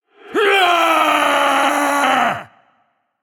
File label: battle cry; smite; gilgamesh